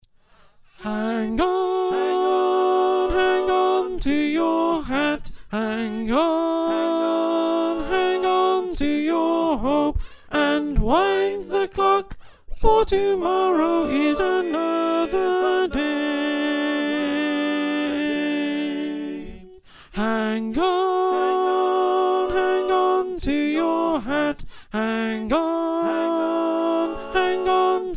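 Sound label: Alto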